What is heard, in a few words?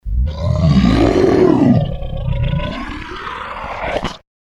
Dragon Dinosaur Roar And Chomp; Monster Sound Effects; fantasy sound effect